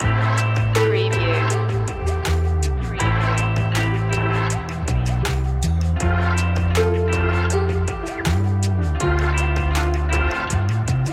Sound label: loop